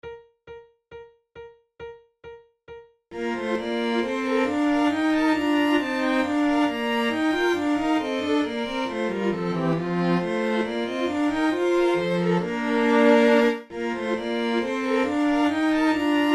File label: flute